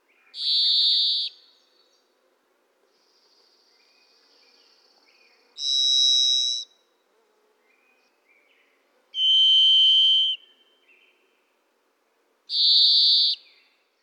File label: Varied Thrush Sound